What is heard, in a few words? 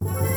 Music Fight Brigands Theme Average Bpm160 Start; asterix obelix slap them all 2